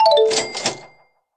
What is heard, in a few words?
Sell Item; UI